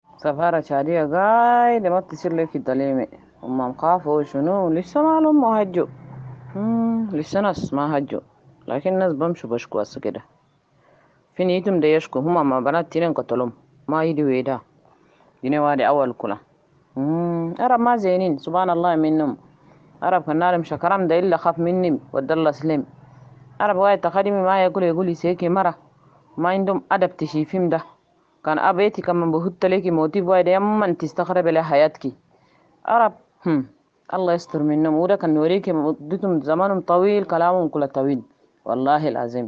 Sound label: Vocal